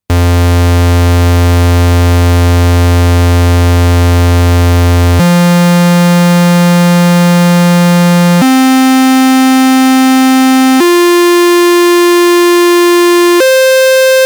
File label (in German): Rechteck D